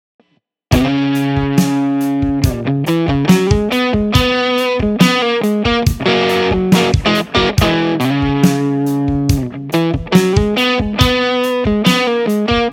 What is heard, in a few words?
Slow Groove